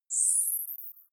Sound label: navigation point